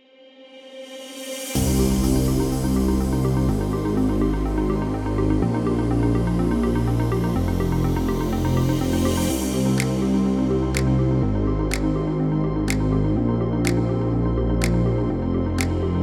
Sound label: Instrumental